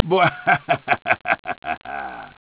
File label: wuhuhu